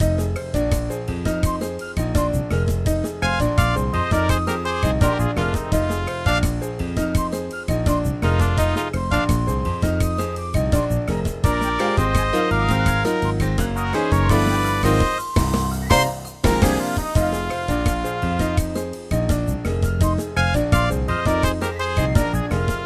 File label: Salsa